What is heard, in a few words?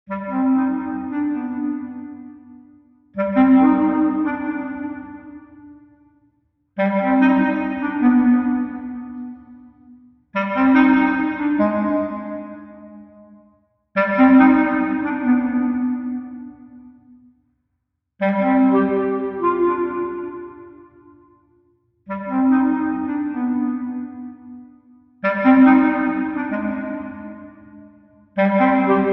CLARINET A